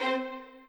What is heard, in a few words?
strings